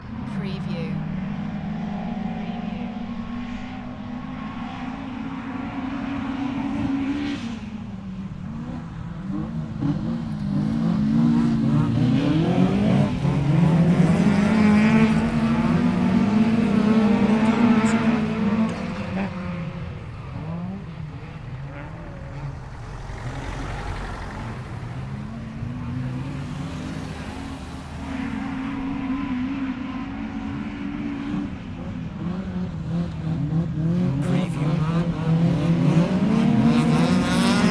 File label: Car racing on grass distant perspective with light aircraft flyover at end; beanstalk audio